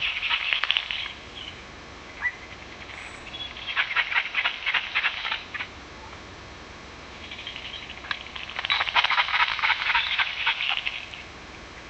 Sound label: echoing forest roar shak; animal calls